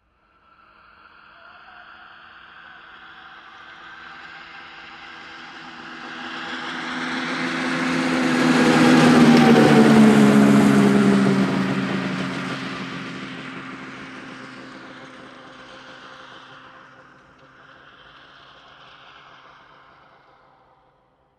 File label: Snowmobile passing by